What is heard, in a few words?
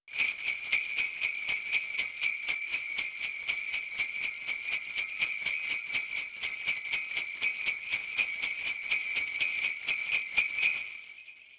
Sleigh Bells Fast; Rust